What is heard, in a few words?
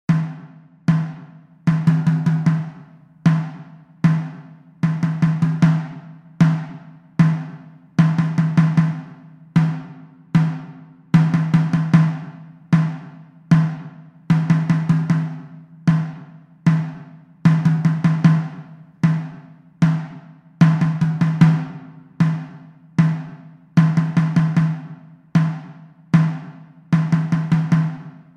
field drum groove